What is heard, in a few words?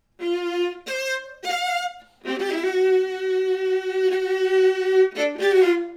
viola